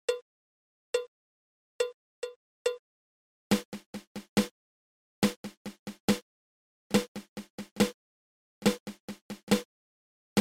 Flam Paradiddle

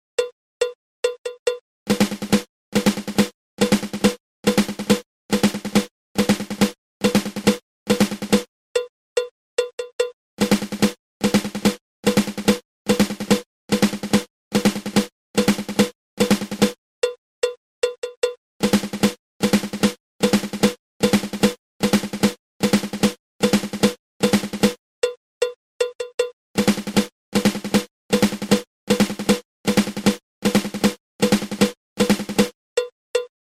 Flamacue

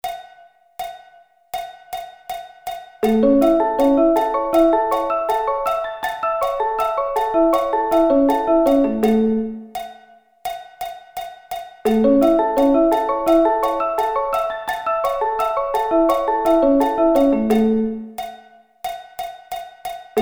A Arpeggio Inversions

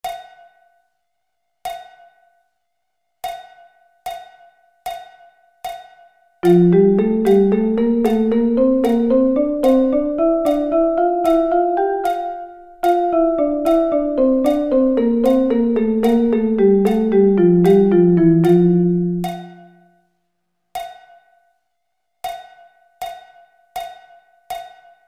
Double Sticking

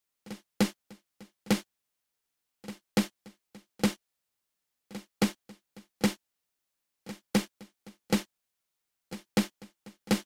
Flamacue open close open

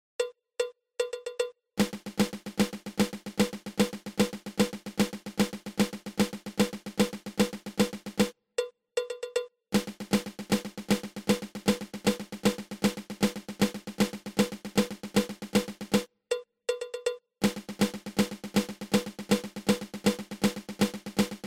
Flam Accent

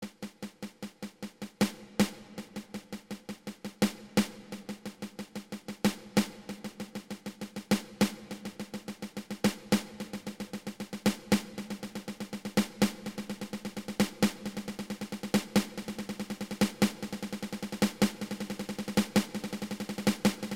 Ten Stroke Roll open close open

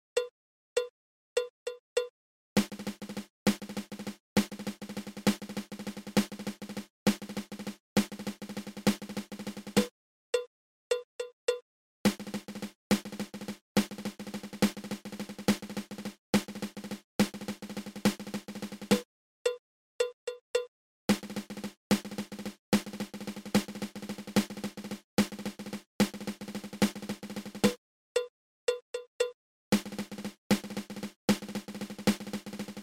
Double Ratamacue

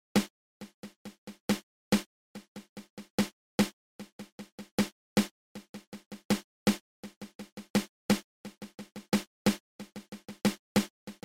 Six Stroke Roll open close open

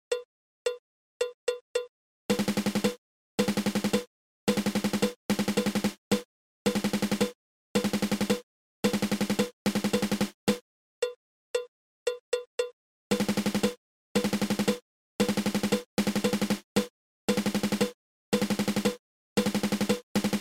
Single Stroke 7

